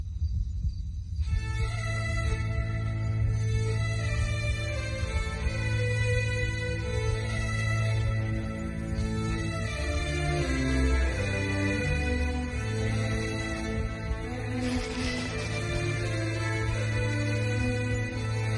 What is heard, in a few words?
Telugu Music Ringtone